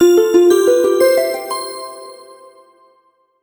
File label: level